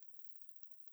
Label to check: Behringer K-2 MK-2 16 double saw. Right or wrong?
Wrong